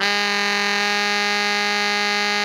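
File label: Tenor growl G#3, Sax; Greytsounds - Philip Wolfe David Crozier's Saxophones; TENOR SWITCH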